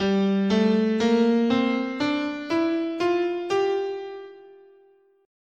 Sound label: G Dorian, scale